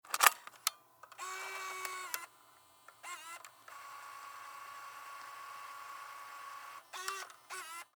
Dv Camera Close Tape Deck Load Tape Panasonic Dvx 1080 Camcorder; Technology